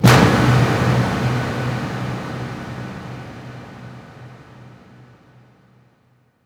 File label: Hits & Explode